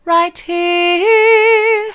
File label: right here soft, vocal